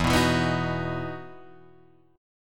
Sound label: E Major 7th Suspended 2nd E down Guitar Drop D